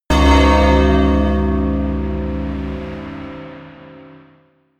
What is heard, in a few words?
melodic church bell tamtam low